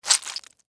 Squish Suction, Texture, Squish, Suction, Wet, Juicy; Squishes; Objects; BlastWave